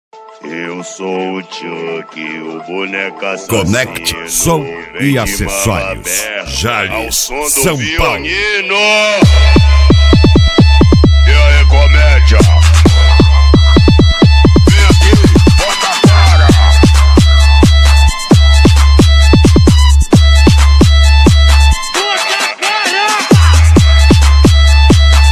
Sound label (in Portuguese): ao som do violino